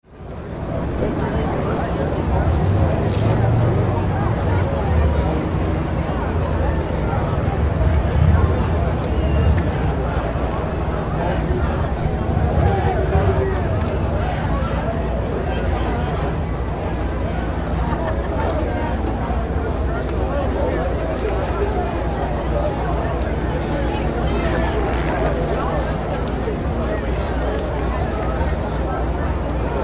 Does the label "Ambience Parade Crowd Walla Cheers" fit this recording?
Yes